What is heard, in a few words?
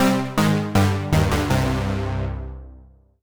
Solo Warm Synth Negative; MUSIC EFFECT; startide